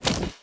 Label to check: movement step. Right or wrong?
Right